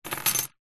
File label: door keys small bunch plastic fob set down table; zapsplat; foley